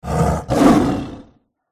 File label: shankara attack voice middle